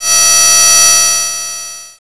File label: beam